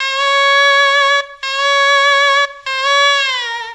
World Lick

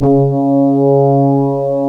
C#, Synclav FM, BRASS; Big Fish Audio

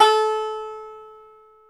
banjo A3; InVision Interactive; Lightware VOL-1; Instruments & Percussions; BANJO ONE

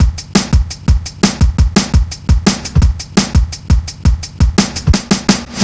170, Drum Loops, Drum & Bass

